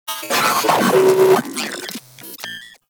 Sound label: Vocal Enemy Spotted; Moa; Vocal Set D